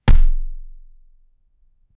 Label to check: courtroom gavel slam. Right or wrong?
Right